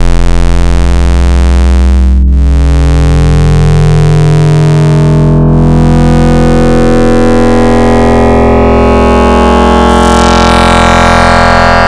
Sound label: Fm Squarify, Serum Wavetables; Synthetic's Serum Collection; Cymatics - Nuclear for Xfer Serum Wavetables